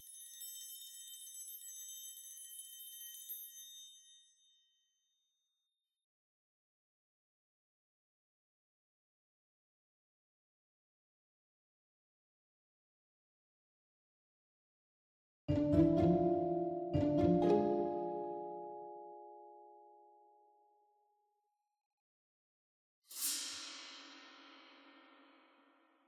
Pizz

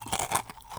EAT Cookie Bite mono

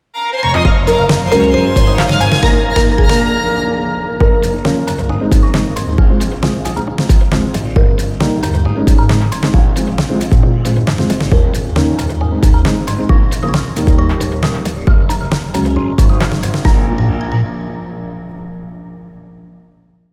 Boss Defeat - Jingle; Touhou Lost Branch of Legend

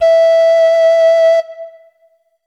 Instrument samples; peppa pig world adventures

